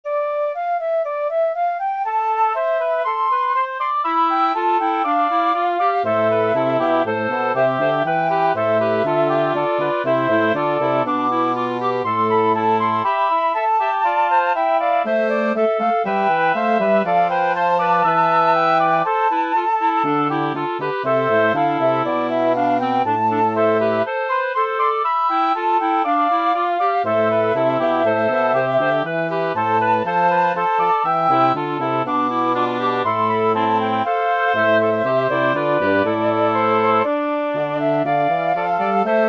for woodwind quartet

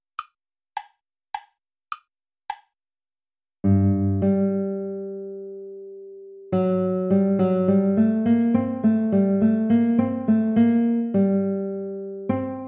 courante play along; haendel georg friedrich

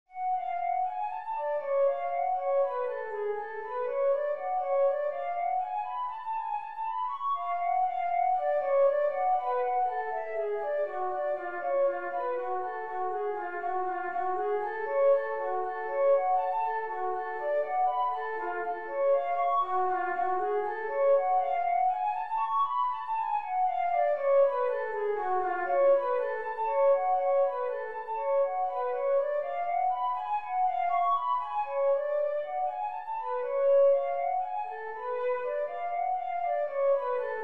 scale study minor key; lewis alastair

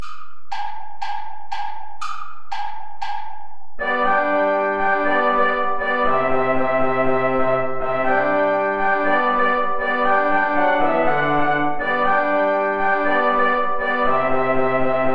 traditional play along without trumpet

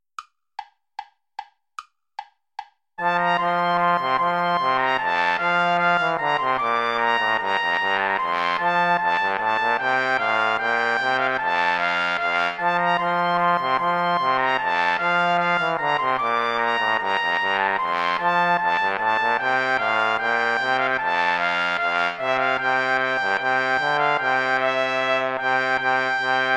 bourree play along without trumpet; praetorius michael iii

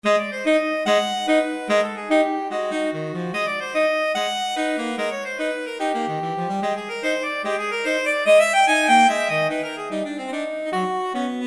duet for saxophones con moto

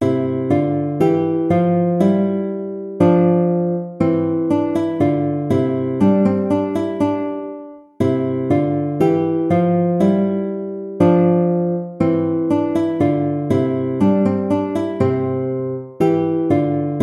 gavotta; hook james

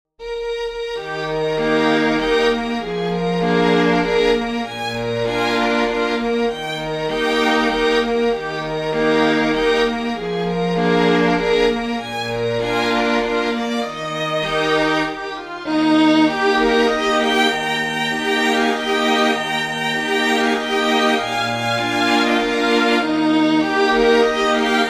string quintet

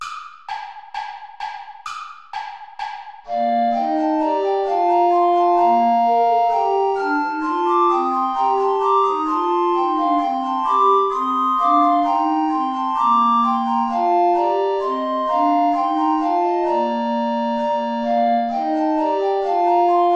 play along without tenor recorder; praetorius michael ballet des feus

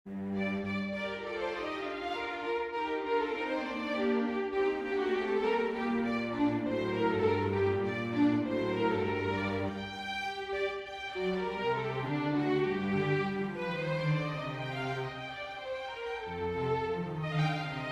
fantasia minor for string quartet

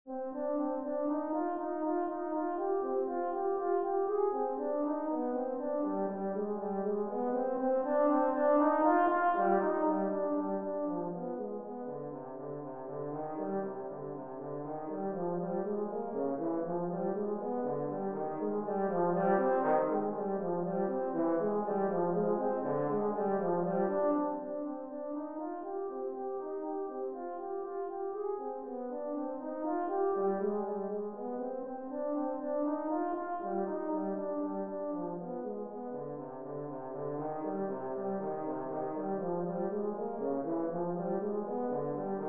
scale study in a minor key; lewis alastair

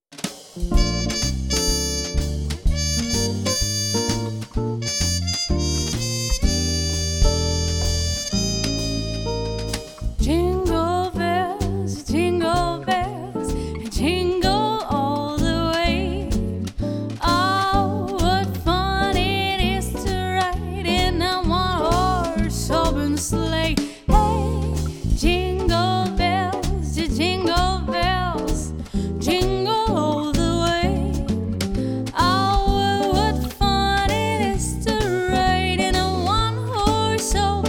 jingle bells; pierpont james

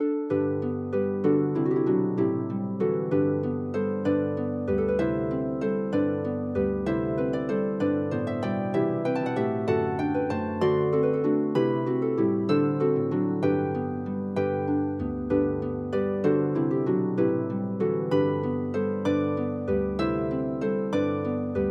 for harp duet

